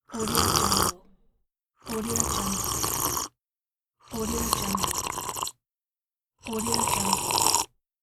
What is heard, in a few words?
the sip coffee